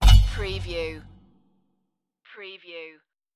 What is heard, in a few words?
hollow space shot